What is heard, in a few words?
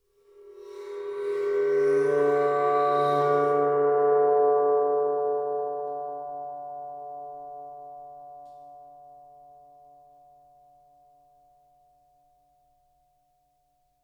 tamtam bow stereo, Percussion; MIS Pitches - 2014; Tamtams